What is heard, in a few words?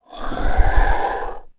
moan; The Elder Scrolls III Morrowind GOTY; XBOX